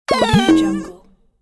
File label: cartoon run down boing